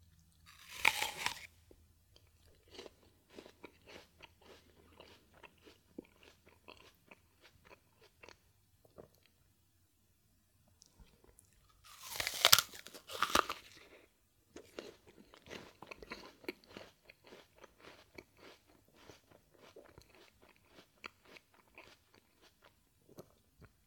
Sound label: Chewing Loud Clear Chewing Noises